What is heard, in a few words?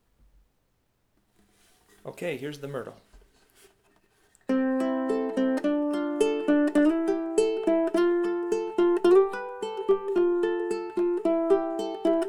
black Stripe Myrtle, ukuleles